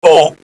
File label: EAST GUARDIAN hit; Eft